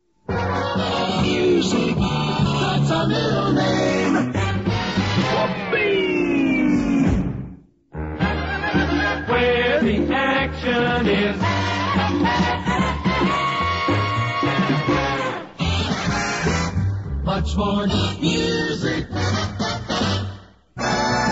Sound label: jingles classic